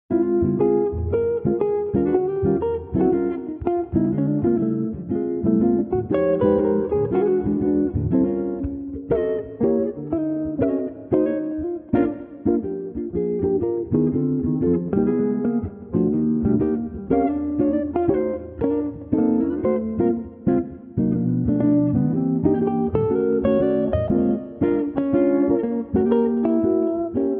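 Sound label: Street Guitars Jazz Blues Nonchalant, 80 bpm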